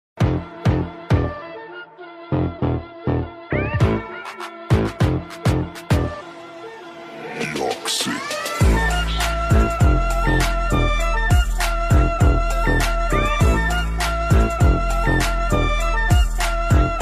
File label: english music ringtone